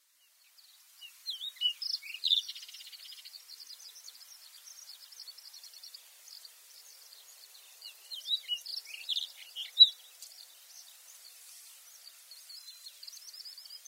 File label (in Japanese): kuronobitakis, akune, koe